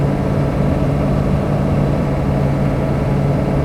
MOTOR RPM 4X4; SIMUZENSOUND

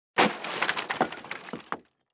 COLLISION WOOD; SIMUZENSOUND

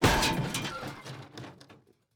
COLLISION HIT, Collision Hard Hit; SIMUZENSOUND

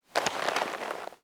MOVEMENT PEOPLE; SIMUZENSOUND

